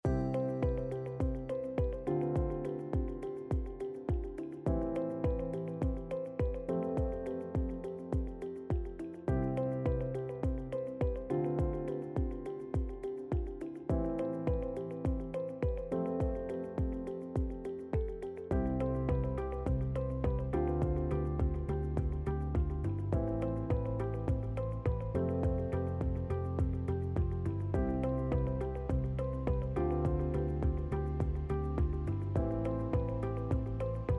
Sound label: Corporate